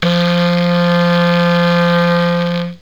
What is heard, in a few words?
clarinet